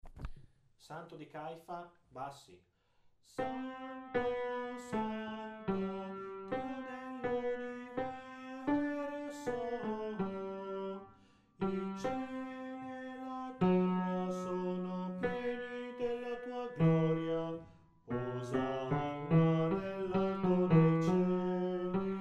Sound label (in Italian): bassi